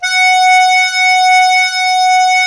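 DIATO F#2, diatonic accordion, Historical Instruments; USB Soundscan vol.23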